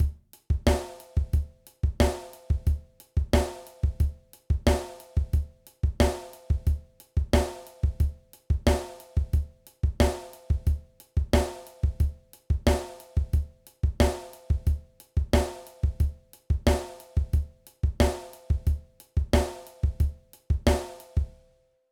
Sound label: Intermediate Drum Beats